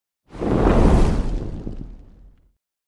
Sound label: woosh saber laser